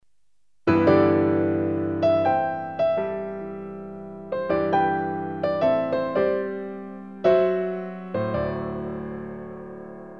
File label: piano poetico